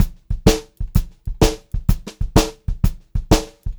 126 CL VARI L, Pop-Rock Drumloops; USB Soundscan vol.37; AKAI; 90 s Sample CDs

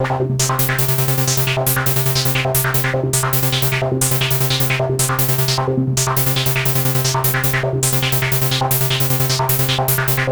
POLYRHYTHM C; Masterbits - Tekkno Trax; SQ LOOPS

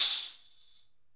Crasher Hit, Percussion One Shots; Essential Percussion

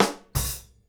FILL, Grooves Filz Hitz

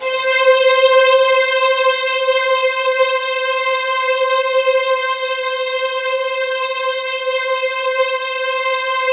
C4, Roland JV 2080 TECHNO PAD

